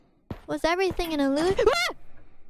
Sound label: Ouah; IRyScream